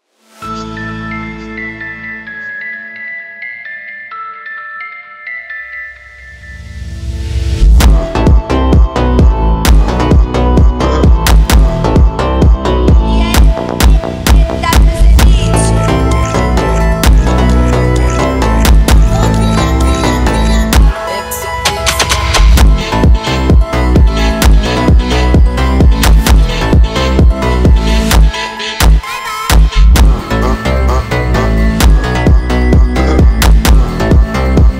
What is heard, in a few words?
Phonk Remix; Tik Tok